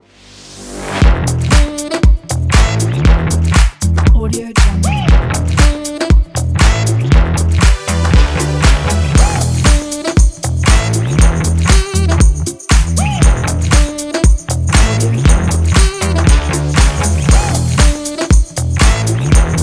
energy groove funk

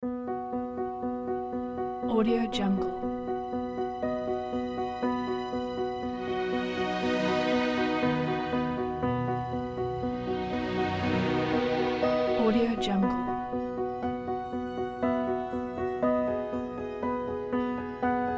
touching piano and orchestra

